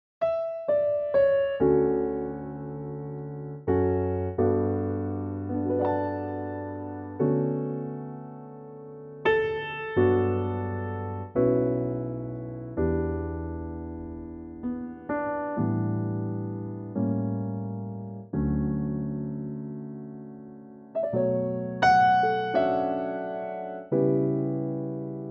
piano D; key - D

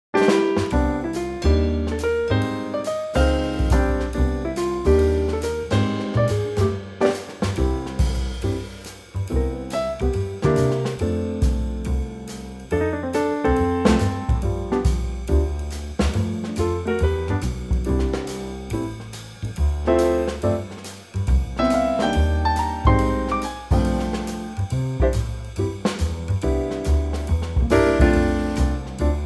Trio C

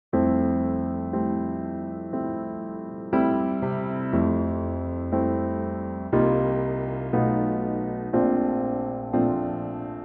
F piano; Pianotrak; key - F - vocal range - C to A (stays to the upper range)

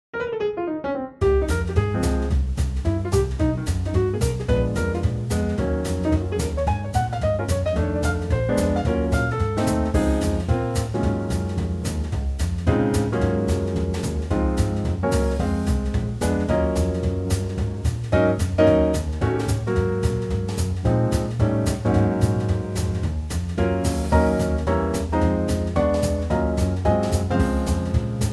Db 220bpm; key - Db - vocal range - Ab to Db; Very cool Trio arrangement of the popular classic standard, bright tempo at 220bpm

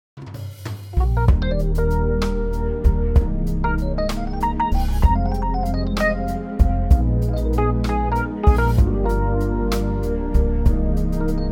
Eb 12 8 smooth; key - Eb - vocal range - Bb to D

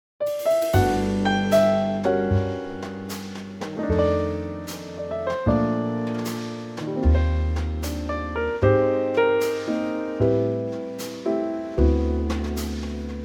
Trio G

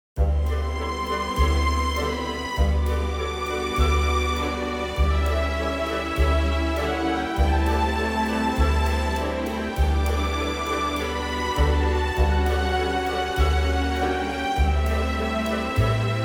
orchestral

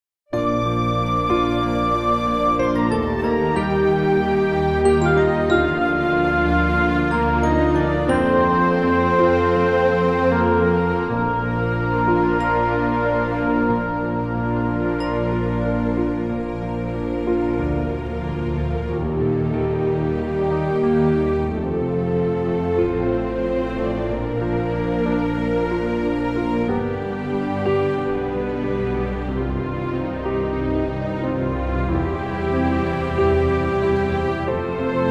Bb orchestral

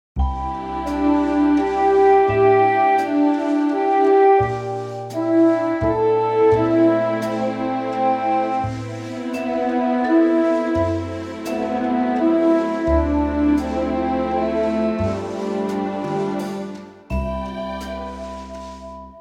orchestral C

